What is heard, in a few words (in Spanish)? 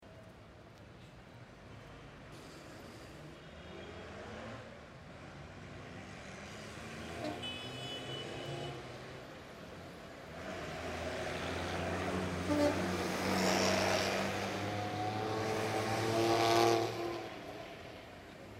BUS Y MOTO PASANDO EN AVENIDA